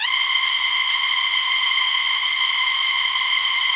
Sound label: SPACE STRINGS, Yamaha VP-1; Music Store